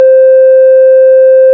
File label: ORG, Farfisa